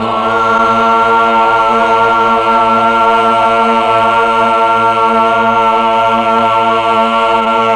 MELLOTRON vox; Big Fish Audio - First Call Vintage Keyboards

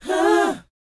BOYZ, Vocal, R&B Dance

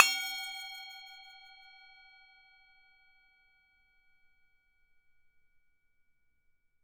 Tibet Bowl; Polyend; Simulacrum Sounds; 1 Shots; Acoustic and Electric; Drums

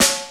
SD, DRUMS; Crypton - DrumBase5000; CD DRUMS #1

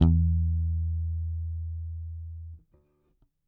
Whole Note One Shot E, Bass; Bass X

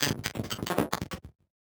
Casio, Glitched, malfunctioning synth

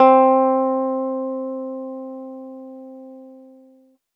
ZITHER; Best Service; Alpine Volksmusik

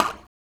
XTRA PERC

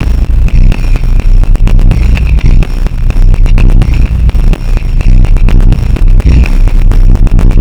Monomorphic Groove; Extra Tweak Grooves

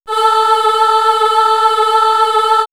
Swirls Choir, pads; X-Static Goldmine

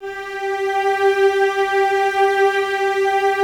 Cellos G 4, String Ensembles; Miroslav Vitous; LINKS ORCH

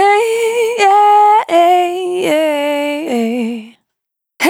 Spin Dry, Single Voice Melodic Loops

